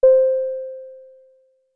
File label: ding